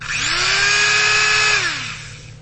zvuk elektricheskogo miksera

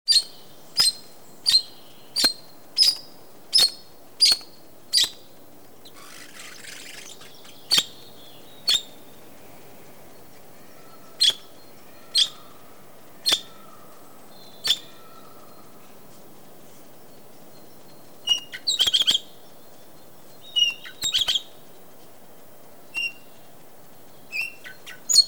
dlinnohvostij popugaj

